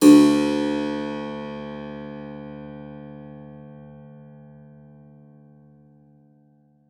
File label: SPIN C#2, SPINET; Xsample VOL-7